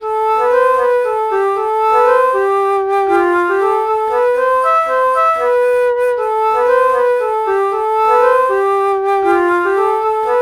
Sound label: alto flute alto flute melody morph